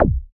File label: FX A, ANALOG; Sound & Vision - Gigapack II